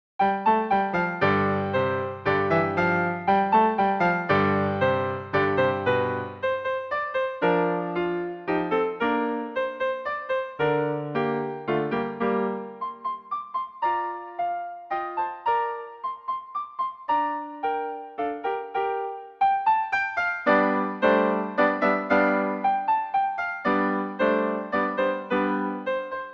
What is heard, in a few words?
Drinking Song; libri di pianoforte per i corsi di base